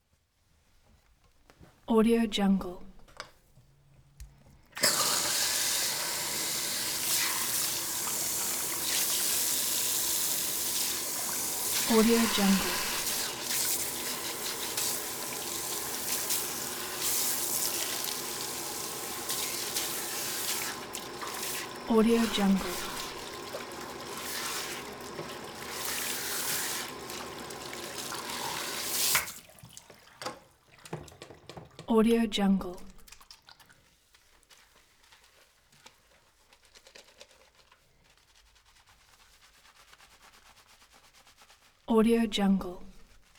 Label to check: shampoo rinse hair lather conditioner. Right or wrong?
Right